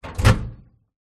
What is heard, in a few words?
household microwave oven door open recorded inside